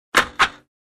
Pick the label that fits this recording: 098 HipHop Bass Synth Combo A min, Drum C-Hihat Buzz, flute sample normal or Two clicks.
Two clicks